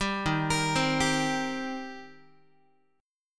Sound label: Eb 6th Eb down Guitar; Eb6 arpeggiated; 10,11,13,10,11,x; JGuitar